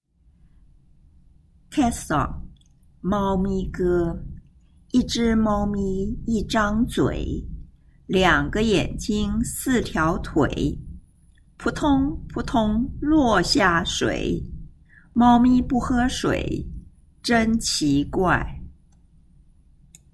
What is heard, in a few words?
Cat Song